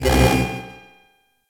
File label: Head Track, Ambience Props; Hub; Simaris